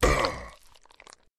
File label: zombie hit